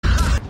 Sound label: ha laught